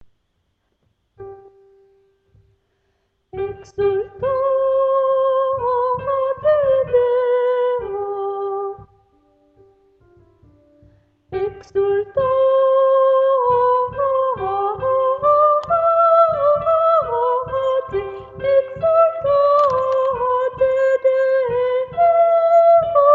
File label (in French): soprano exultate